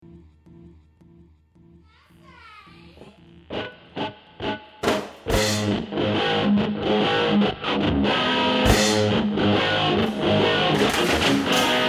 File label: solo